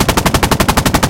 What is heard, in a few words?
loop nshk, guns tanks; War Thunder